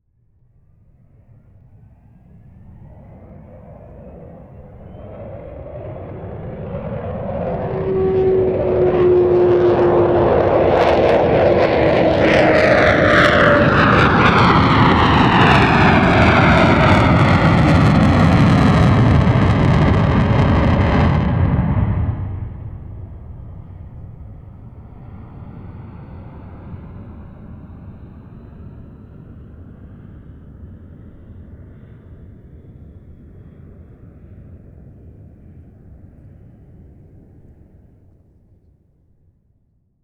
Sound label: Avro Vulcan Bomber, Over Head Aircraft; Timothy McHugh